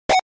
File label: roblox death sound variations